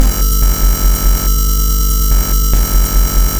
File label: wave